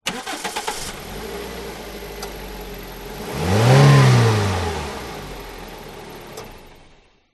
vehicles car start rev off short toyota corolla front radiator pov; zapsplat